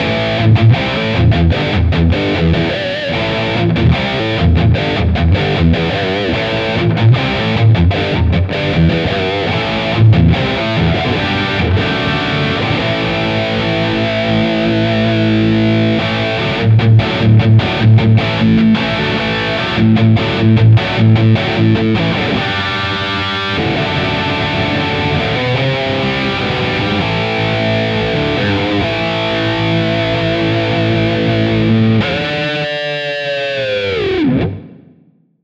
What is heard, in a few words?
Highgain Pure Amp; Floorboards im Vergleich; Referenz S Gear Plug in